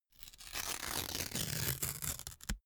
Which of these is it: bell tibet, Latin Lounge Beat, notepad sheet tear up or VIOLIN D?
notepad sheet tear up